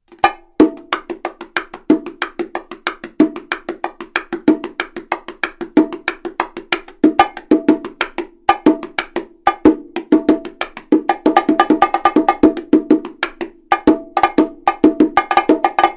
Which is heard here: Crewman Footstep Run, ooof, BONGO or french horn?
BONGO